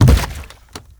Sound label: Gas Tank Impact